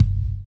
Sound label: HI RIDER; Spectrasonics; Metamorphosis